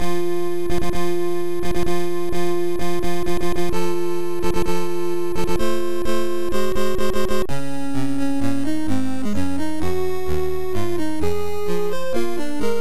victory; Star Wars The Empire Strikes Back; NES

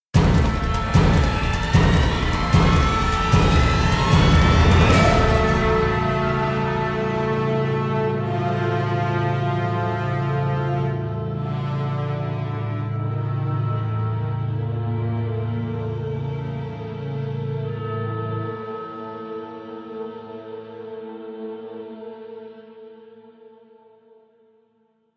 Vault Of Tears Glory Kill; Infinity Blade 3

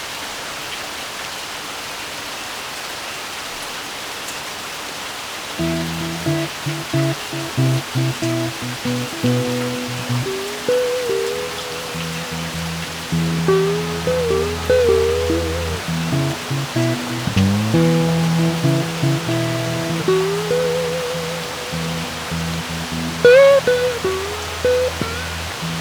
MUSIC blues heavy rain

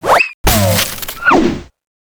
Boss Attack

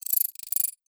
move chip; through abandoned 2 the forest

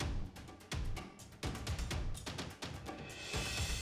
BPM126; the legend of zelda tears of the kingdom

